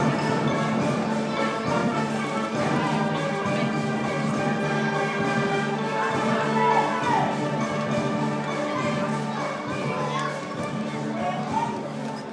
wind orchestra; pula

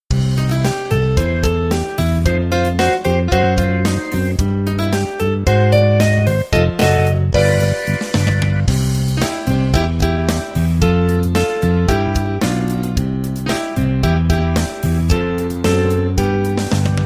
bluesy E

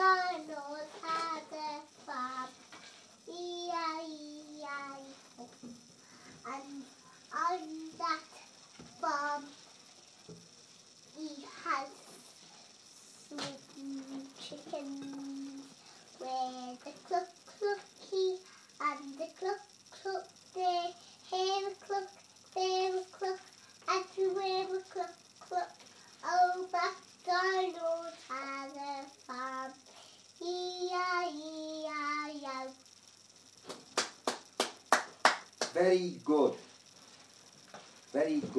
small girl sings old macdonald

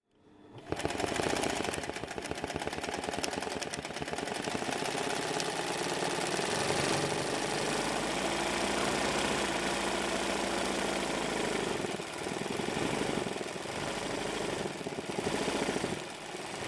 Irish sewing machine

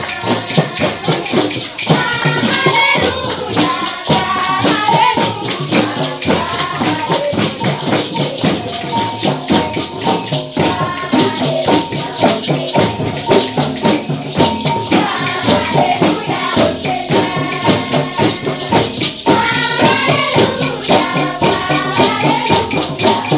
singing

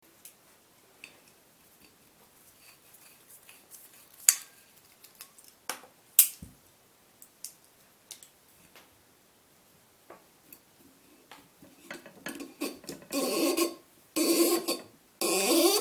decanting a bottle of wine soundscape

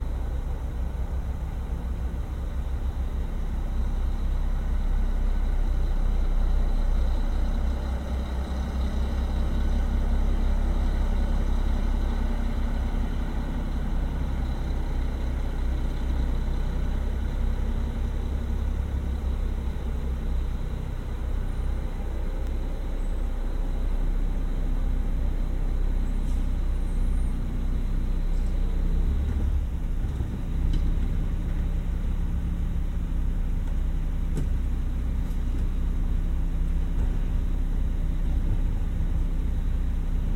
chicago westside garbage truck; via locustream

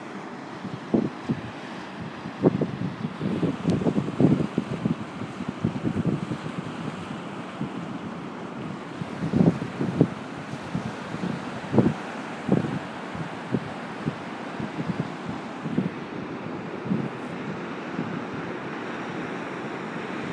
robin hood s bay waves

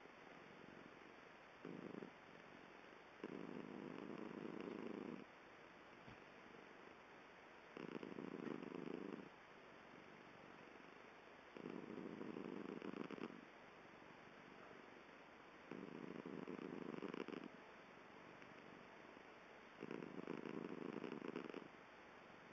purring; pitter

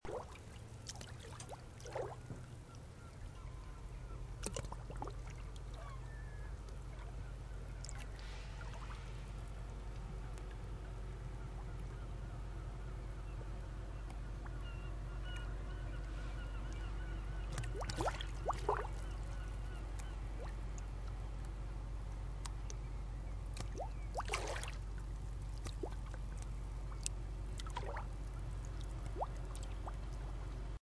Falmouth harbour early evening

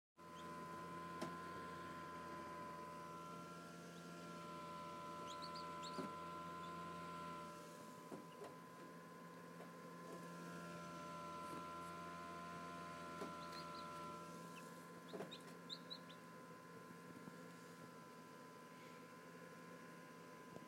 chirping

